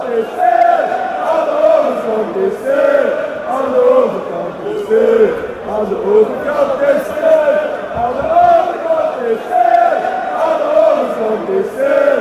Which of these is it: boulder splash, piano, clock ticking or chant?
chant